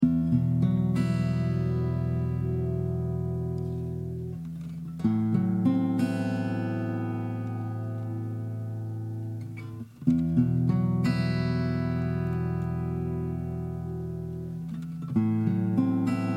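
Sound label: Em 95 guitar motif volume pedal fx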